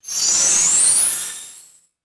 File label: bright shimmering magical spell cast